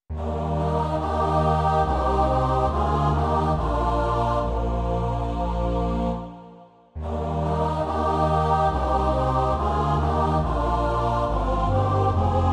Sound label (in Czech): dětský sbor a kontrabas; V tebe, Hospodine, doufám; Imaginativní verze